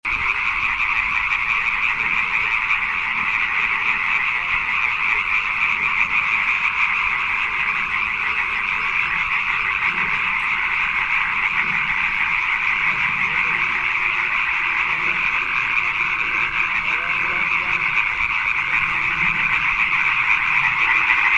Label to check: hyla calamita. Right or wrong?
Right